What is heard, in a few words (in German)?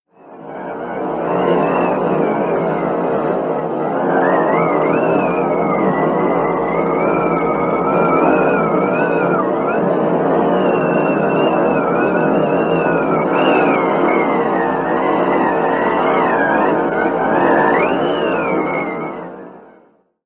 Natur Schneesturm Blizzard sound